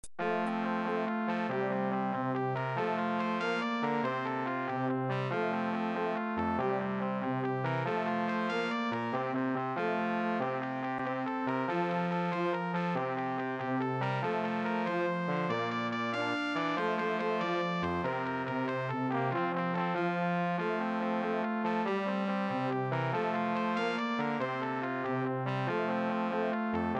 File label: Brass Trio